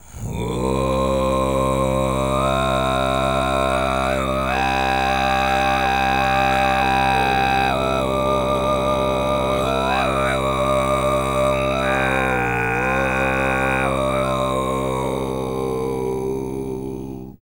FREE, TUVAN LOW; Spectrasonics Vocal Planet CD5; World Voices

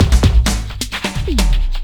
Beat, Drums & Percussion; Electrocution; Beats

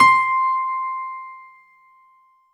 C5 DANCE, ANALOG SEQ, Roland JV 2080

